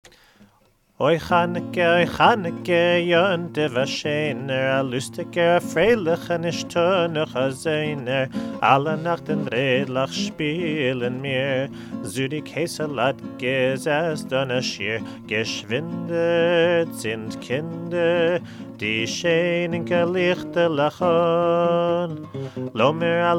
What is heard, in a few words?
Oy Chanuke both verses Yiddish